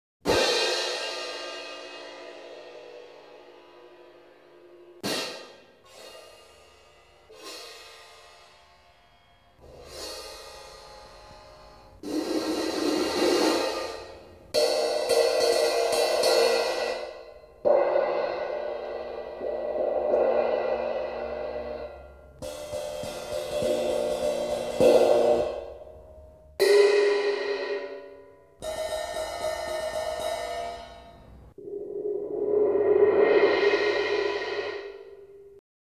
Sonido de platillos; Instrumentos de percusion; Percusion indeterminada; metal

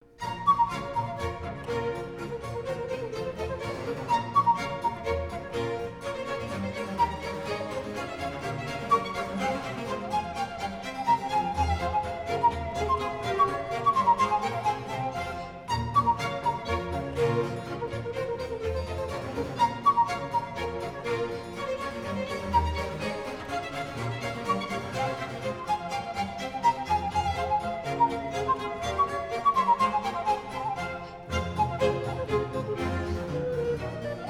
Badinerie de la Suite n 2 en si menor; Bach; Barroco

